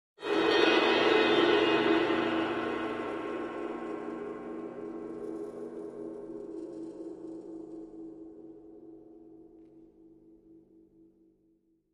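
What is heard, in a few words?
Cymbal Large Trill Timpani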